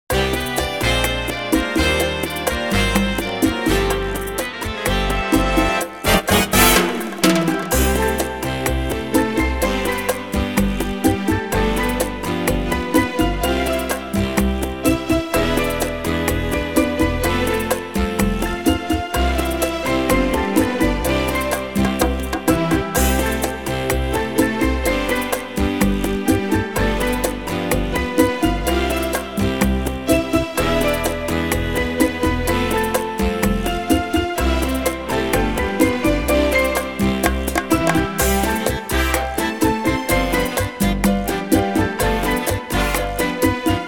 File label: CHA